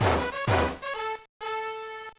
Jingle; gba; famista advance 2002

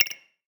Ui Mail Button; portal quest; android

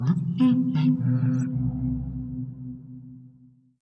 whale

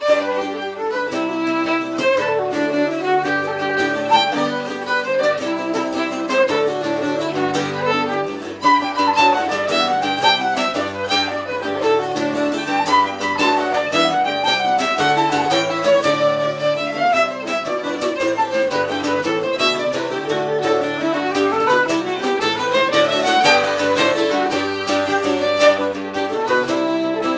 Orchestra Ambience; Assassin's Creed III

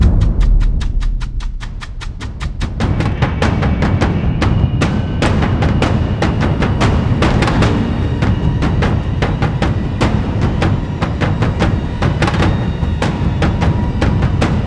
Combat High Drums; Battlefield 1

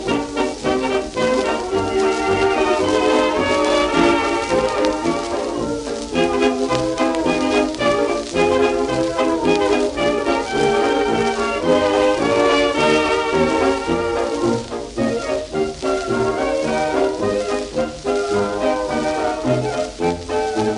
Unknown Instrumental

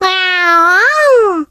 voice cat nya; moe pachinko; android game music

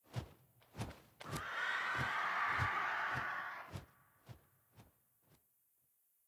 Vulture Overhead Fly By; The Town; 3D Environment Clip

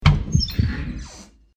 Door Open; Backrooms 2D

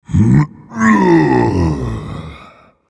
Vocal Colossus Efforts Medium

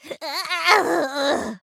stagger

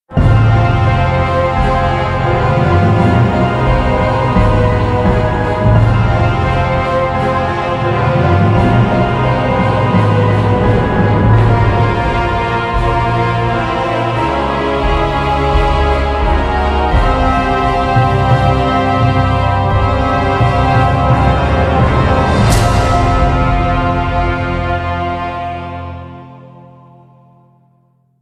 Failed; Settlers Heritage of Kings original soundtrack

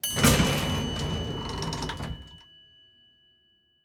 Elevator Arrive; Devour 2021 gamerip pc macos

